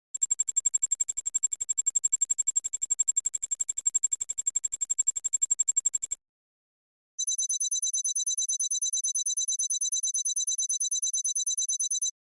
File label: UI Data Loading